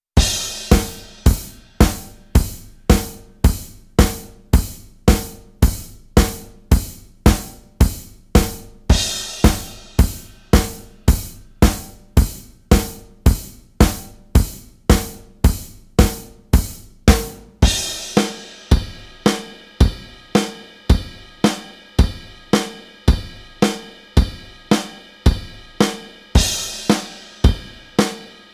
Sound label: rock drum kit